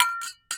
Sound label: Hit Glass Jar Clink; SFX Kit; Foley - Misc